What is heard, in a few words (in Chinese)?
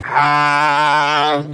哈 D5 颤音; 哈比下; 电棍